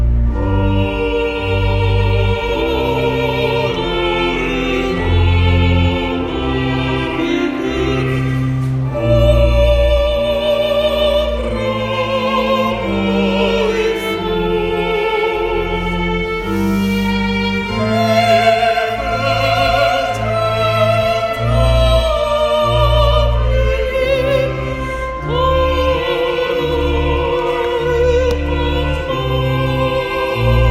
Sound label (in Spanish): grupo musical para funerales